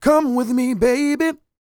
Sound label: SING, Vocal Planet R&B & Dance; ILIO